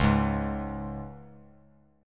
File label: C sharp 5th C sharp; strummed; C#5 Chord; JGuitar